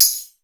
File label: TAMBOURINE; East West; Global Sounds; HR16